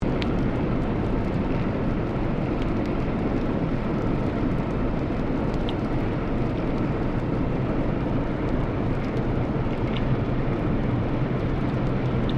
Boat Ferry Freshwater Class Engine Maneuvering Submerged; SYDNEY